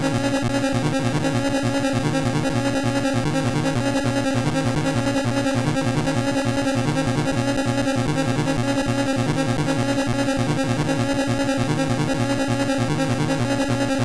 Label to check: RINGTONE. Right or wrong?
Right